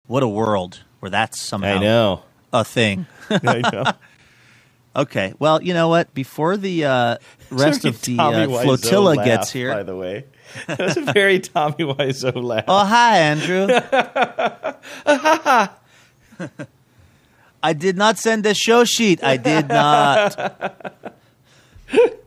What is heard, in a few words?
laughs talks like; tommy wiseau